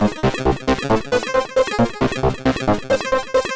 Kaossilator gated (130BPM); Korg Kaossilator; creative gate; musicradar

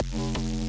Neuro Bass F1

